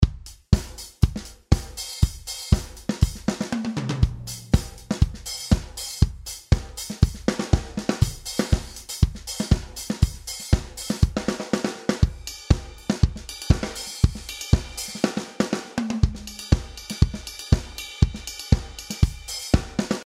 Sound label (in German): Audiodemo mit einer kontinuierlichen Fahrt durch die Komplexitätsstufen, Betonung auf 1 und 3 sowie Fill alle 2 Takte; Hi-Hat; Steinberg Groove Agent 4